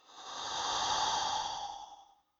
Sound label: Alien Breath